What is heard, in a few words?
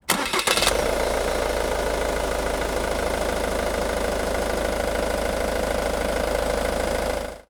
van starting; transportation